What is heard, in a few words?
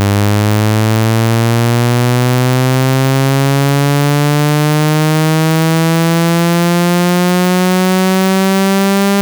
sweep; Synthesesignal (carrier); einen Sägezahn-Sweep